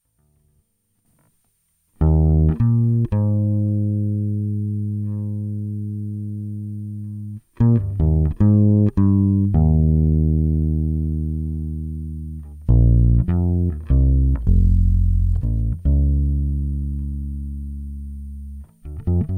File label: pickup